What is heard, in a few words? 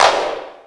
clap single hit d sharp key, Royality free hand clap sample tuned to the D# note. Loudest frequency: 1592H; single hits one shots